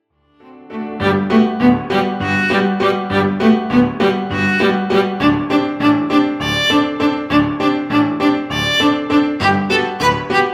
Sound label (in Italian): toccatina